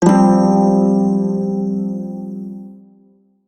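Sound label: pentatonic minor chord, shawzin; F; Warframe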